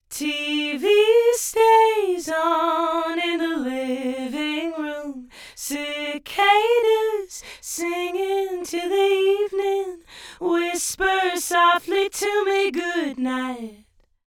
vocal dual mono 35ms delay on right, Right side delayed by 35ms; Haas effect